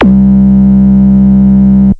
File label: 202 sus, synth; 202 synth construction kit